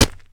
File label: projectile hits dirt; grass